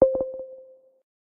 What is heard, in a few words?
Button; Treasure of Nadia